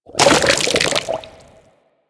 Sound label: Settlement Oil Bubble Burst; Ambience; Warframe; Grineer Settlement